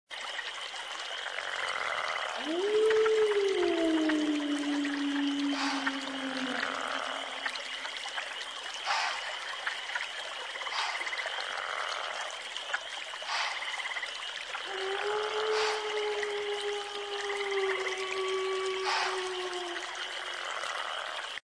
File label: Wolf Talk, nature sounds